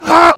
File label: heavy pain sharp